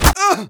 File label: pain, player; Team Fortress 2